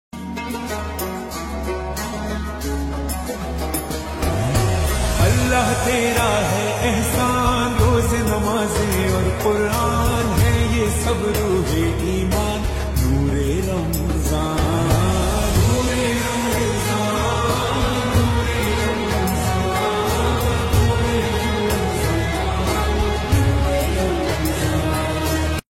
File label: Happy Ramadan Ringtone